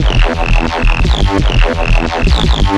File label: DNB Bass; CicaSpeak